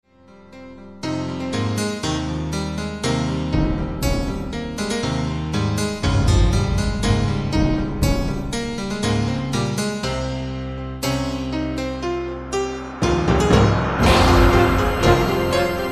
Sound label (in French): synthe extrait